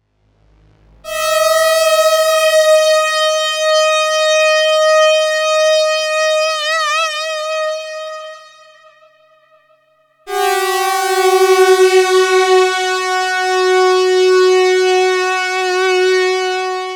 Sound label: obx unisono